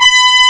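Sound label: TRUMPET SYNTH; CMI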